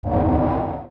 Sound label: King Dodongo Dizzy